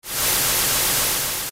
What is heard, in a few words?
wind; ff3; ff3us